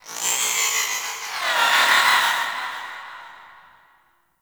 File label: Sentient Lair Exterior Creature